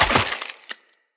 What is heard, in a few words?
Short Sword On Killed; Weapons; Player